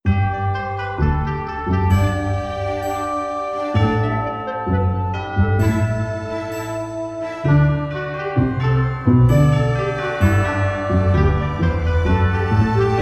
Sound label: stereo MUSIC only; GREEN